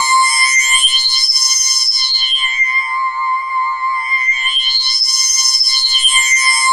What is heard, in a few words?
CLAS LEAD, JV-1080; Syntec; Wall of Sounds